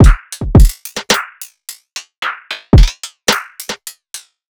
Drum Loop 110 BPM; Drum Loops; Wormhole Drum Loop; Cymatics; Cymatics - King Drum Loops (Teaser)

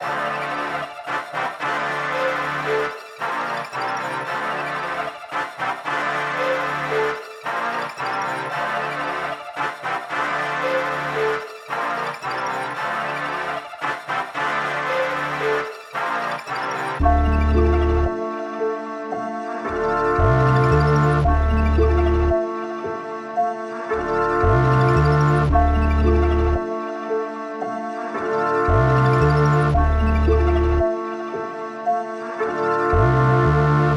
113 BPM D Min, Melody Loops; Bermuda Pt 2; Cymatics

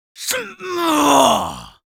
Yells, Viking Vocal

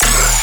Bass Hit D#, Dubstep; Ghosthack; Dubstep Sounds 2025; Luster Bass

